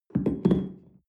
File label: espresso upset tablecloth soft